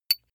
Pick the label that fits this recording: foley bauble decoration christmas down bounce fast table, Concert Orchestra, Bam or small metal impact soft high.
small metal impact soft high